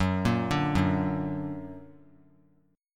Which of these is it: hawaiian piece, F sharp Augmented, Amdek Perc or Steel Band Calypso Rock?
F sharp Augmented